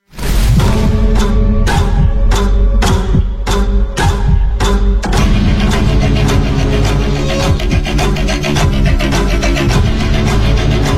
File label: Horror Ringtone; Nightmare Alley